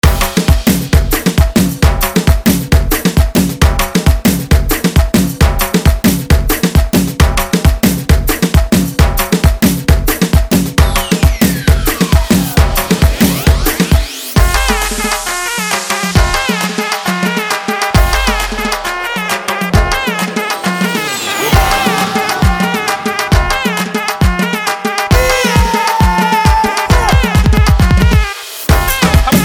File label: Ambiance Party